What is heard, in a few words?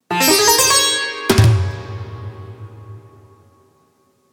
jingle india